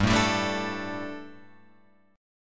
G Suspended 2nd G, down, Guitar, Open F; Gsus2 strummed; JGuitar

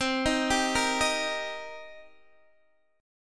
C Minor 7th C Guitar Open D

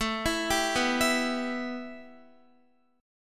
A 7th Suspended 2nd Guitar; A7sus2; arpeggiated

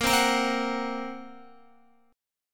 A sharp Suspended 2nd Sharp 5th A sharp, Guitar; strummed; JGuitar

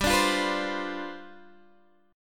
G 11th, Guitar, Open G

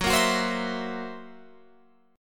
Gb 7th Flat 5th Gb down Guitar Open F; Listen to Gb7b5 strummed

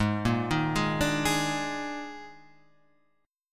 D sharp Major 7th Suspended 4th G sharp

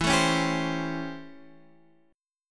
A 7th Sharp 9th

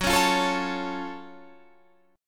Gb Minor Major 7th Gb down Guitar Half Step Down

